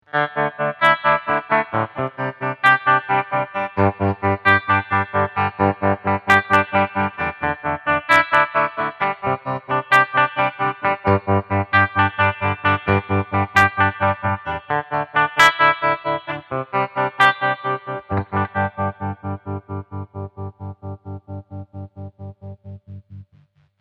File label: Tremolo Reverb